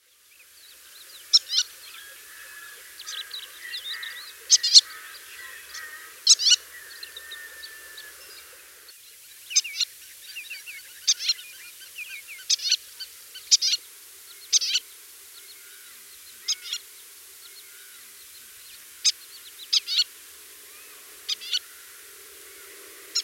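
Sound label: white-tailed lapwing voice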